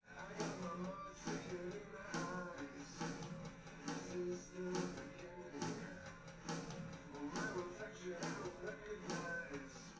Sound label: wdrums